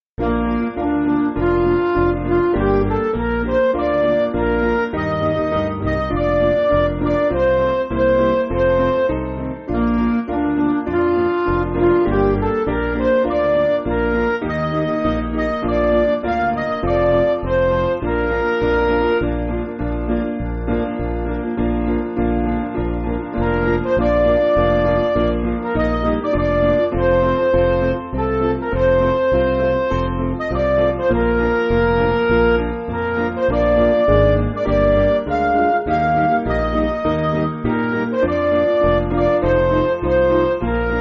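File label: Music Backing Files for Churches; The Angel Voices; McGranahan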